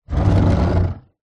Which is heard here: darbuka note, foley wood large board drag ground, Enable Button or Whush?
foley wood large board drag ground